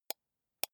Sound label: computer mouse wheel click slow soft